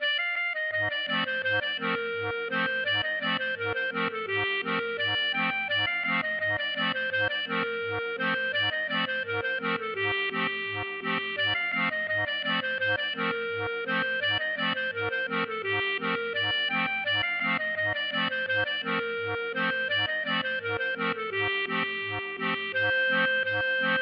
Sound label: klezmer, Wedding Melodies